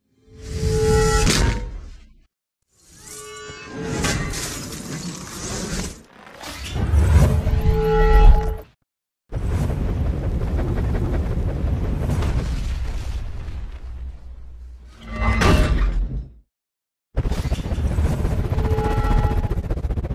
Hammer; Hammer of Thor; چکش ثور

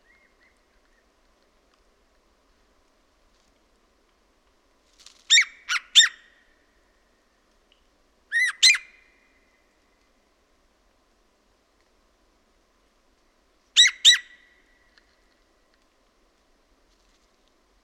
Siberian jay, پرنده جیجاق سیبری

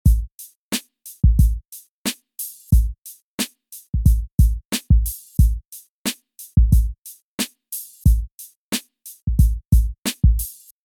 drums rap type east coast loop

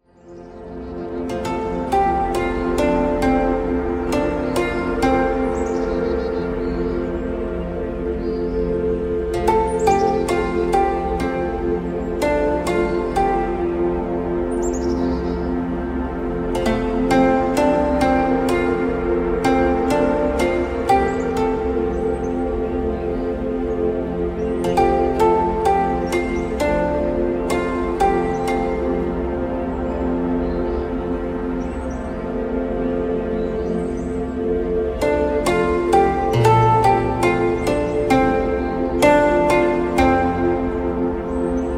Shaolin Temple, موسیقی مدیتیشن

